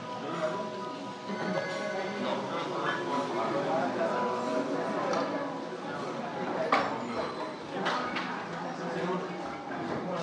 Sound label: ambient noise at coffee house new delhi india